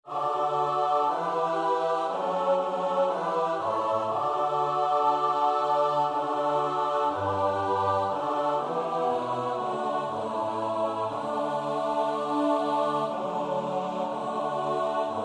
psalm 135 (S-A-T-B), Oefenbestanden

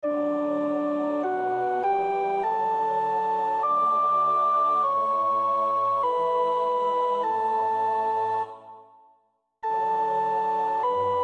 Psalm 138 (sopraan); Goudimel; Oefenbestanden

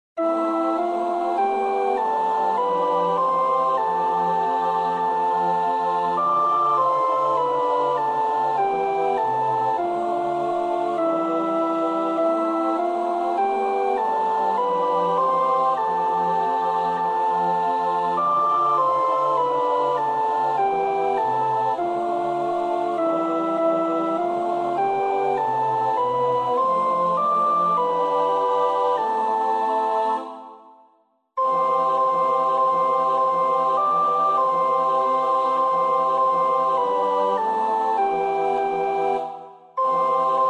Onze Vader (sopraan), Oefenbestanden; Onze Vader (S-A-T-B)

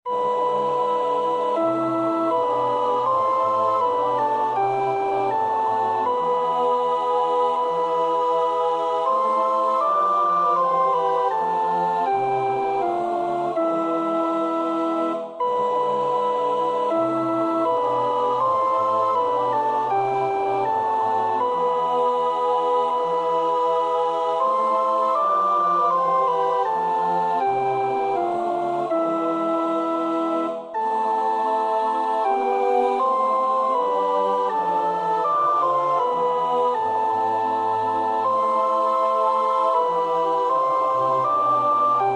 sopraan; Bach (Johan Sebastiaan); BWV 038; Ob bei uns ist der Sünden viel

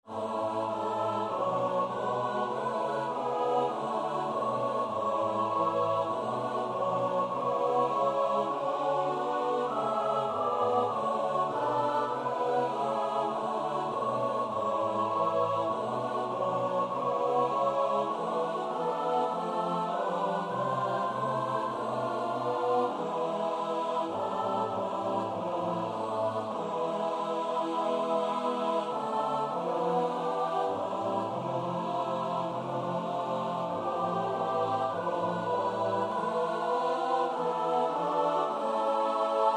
(S-A-T-B) zonder slurs en dynamics, Oefenbestanden

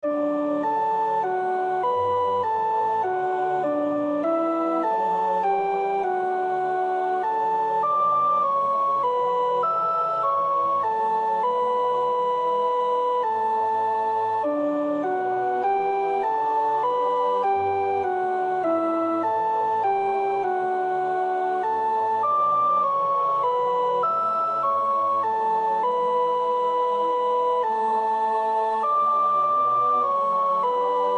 sopraan; Bastiaans (Johannes Gijsbertus); Liedboek 462

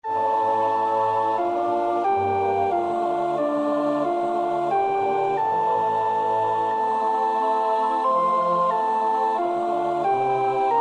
sopraan; Nieuw Liedboek; NLB 796-U Here Jezus roep ik aan

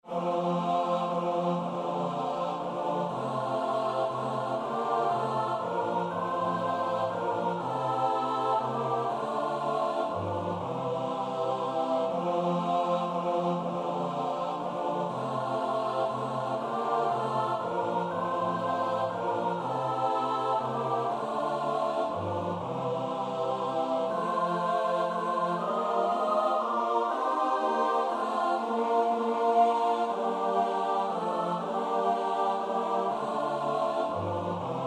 S-A-T-B; Nieuw Liedboek; nlb 975; Oefenbestanden

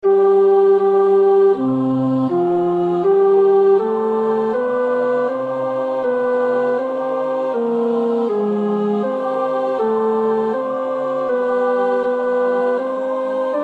tenor; Nieuw Liedboek; NLB.342 In God de Vader op zijn troon

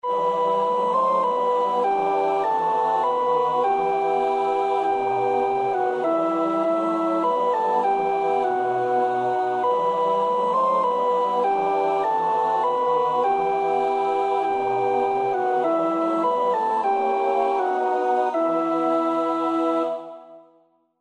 sopraan

